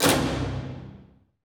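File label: STR SEC, strings; Roland - LA Composer vol. 1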